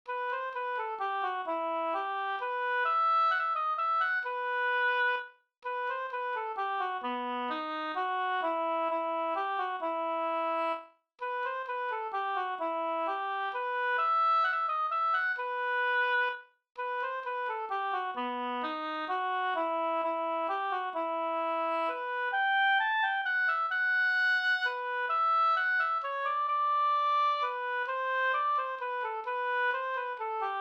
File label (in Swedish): vals